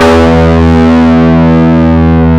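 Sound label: distortion guitar E1, GUITAR; AKAI S-Series CD-ROM Sound Library VOL-8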